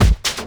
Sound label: COMBI, Houseworx; Ueberschall; Workz-9